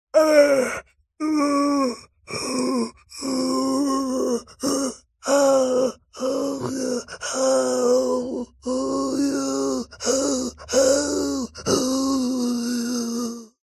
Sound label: human male elderly pain labored breathing; zapsplat